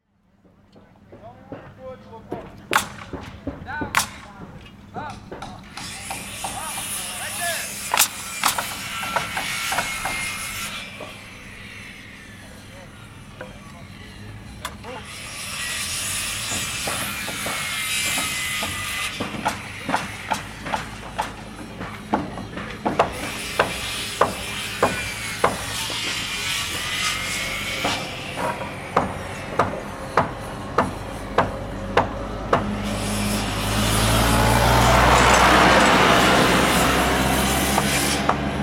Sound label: Ambience Construction Site House Nail Guns Trucks Industry